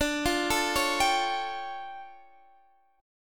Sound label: D Minor Major 11th, down; DmM11; arpeggiated